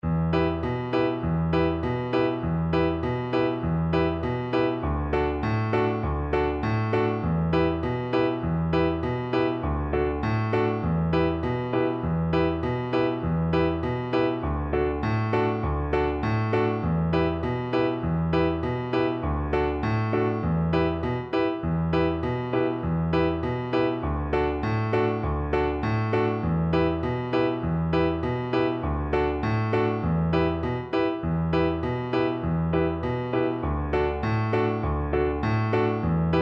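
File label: recorder karaoke; drunken sailor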